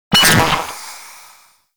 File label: Sentient Sniper Last Shot; Warframe